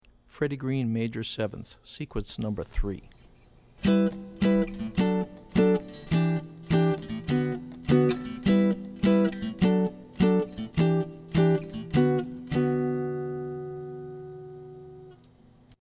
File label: Maj7ths Seq; jazz chording; Freddie Green